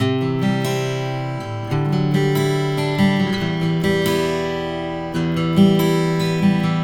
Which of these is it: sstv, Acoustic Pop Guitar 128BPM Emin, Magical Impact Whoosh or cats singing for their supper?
Acoustic Pop Guitar 128BPM Emin